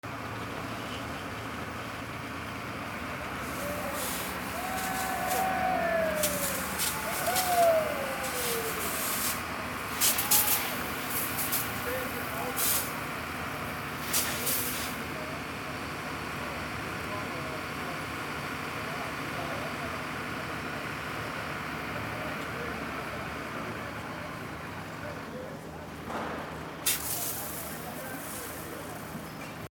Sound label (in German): Silvester Feuerwehr